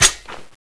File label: claymore trigger shoot on, weapons